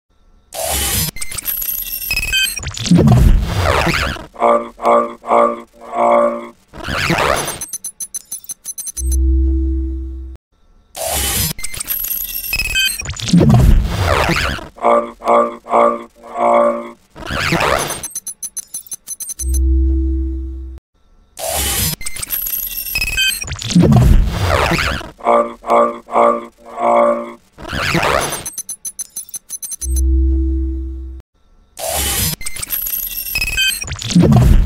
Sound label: keyboard sound